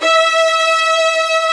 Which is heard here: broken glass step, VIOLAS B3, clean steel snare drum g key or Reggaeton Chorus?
VIOLAS B3